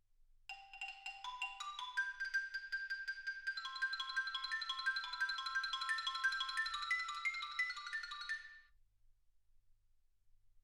xylophone

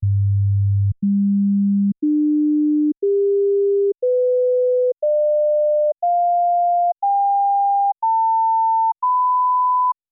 sinus tones 48kHz; OmniPower4296

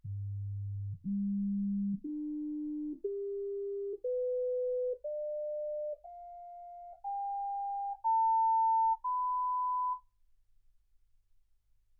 sinus tones 48kHz

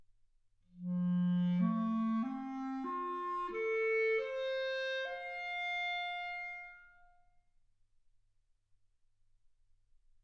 clarinet

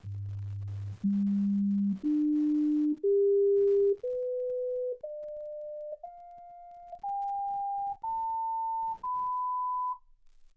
sinus tones 48kHz

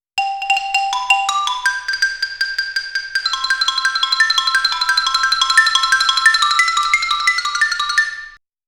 xylophone

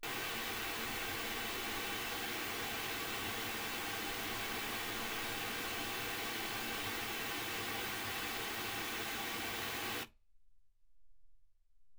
pink noise 48kHz

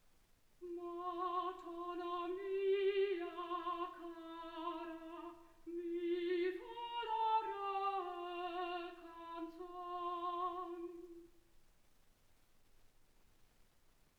soprano

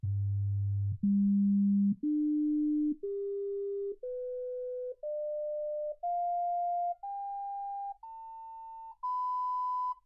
sinus tones 48kHz

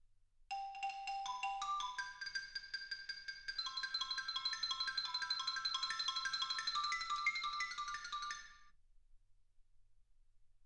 xylophone

